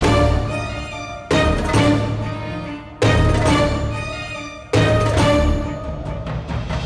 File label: Combat High